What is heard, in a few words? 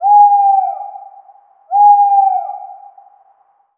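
animal nocbird distant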